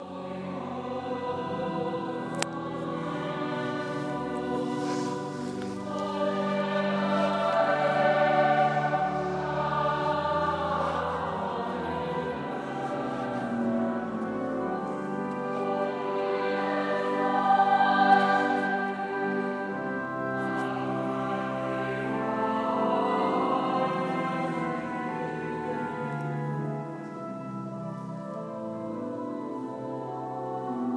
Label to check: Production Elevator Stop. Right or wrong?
Wrong